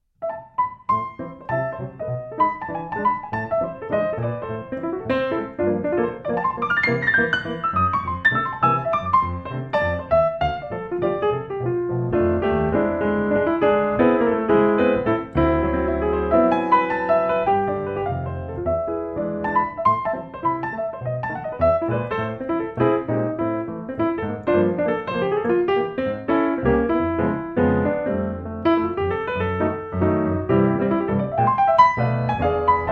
piano jazz; se electronics 4400a; میکروفون استودیویی و حرفه ای اس ای الکترونیکس 4400a

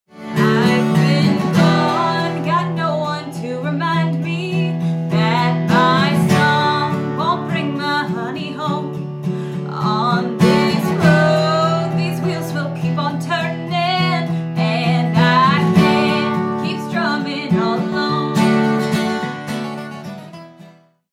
Live Music, Azden SGM-3416

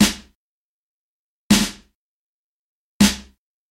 Varying Reflections

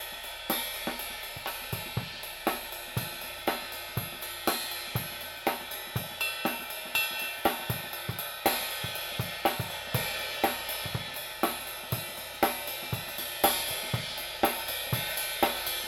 ride

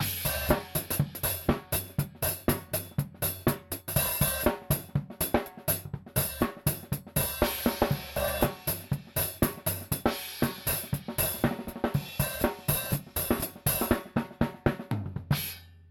hi hat

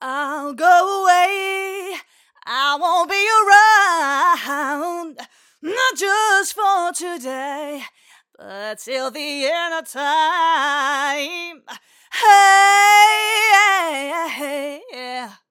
Ballad Female Vocal Dry; se electronics gemini ii

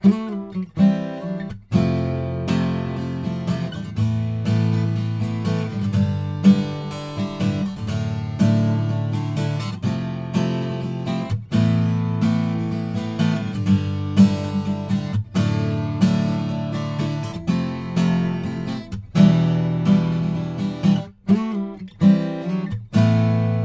Strumming Dry; se electronics vr1

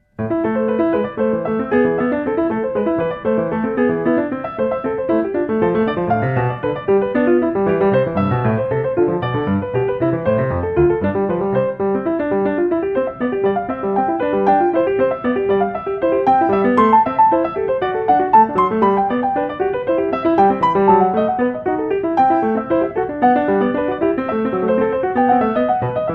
Grand Piano; معرفی میکروفون استودیویی و لامپی اس ای الکترونیکس RN17 Stereo Pair; se electronics rn17 stereo pair

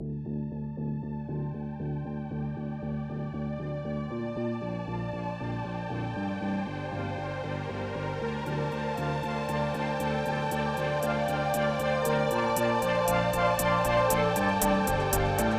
Arturia MicroFreak; سینتی-سایزر; Solidtrax

